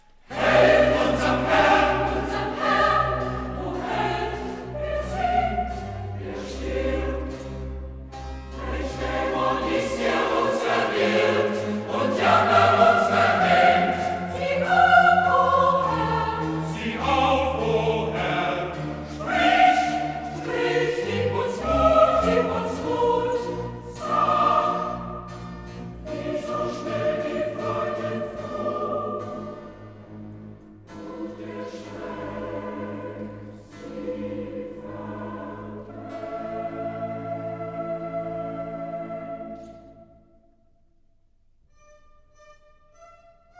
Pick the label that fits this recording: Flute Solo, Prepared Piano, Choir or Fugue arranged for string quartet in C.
Choir